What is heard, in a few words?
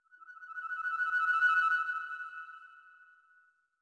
flute trill; Sky Children of the Light gamerip